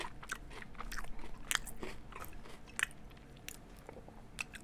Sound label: mouth sounds